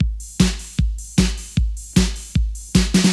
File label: 153 bpm drum loop sample A key